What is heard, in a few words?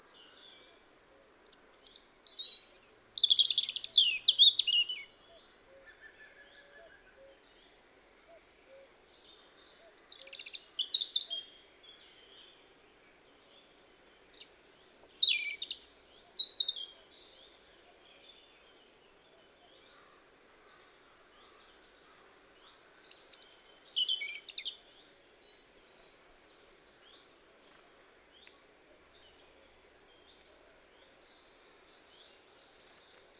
European Robin Erithacus rubecula